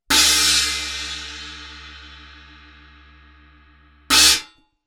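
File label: China Istanbul Mehmet Traditional 14, cymbales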